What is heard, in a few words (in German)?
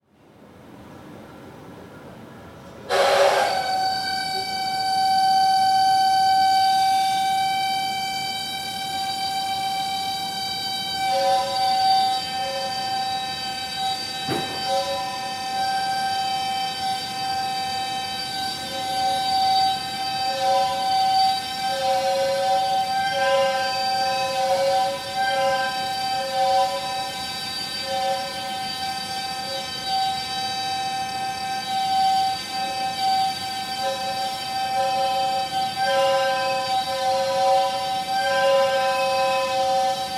Bierkuehlung